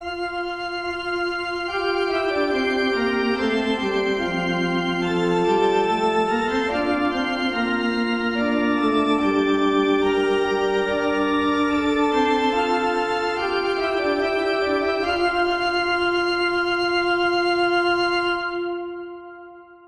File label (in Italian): Esercizi di contrappunto a due voci; Funftel Gattung; Ronge pagina 60